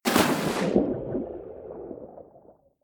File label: enter, underwater; ambient; Minecraft